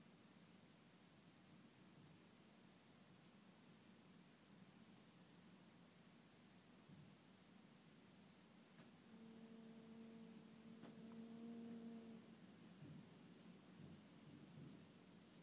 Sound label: a whining window that is all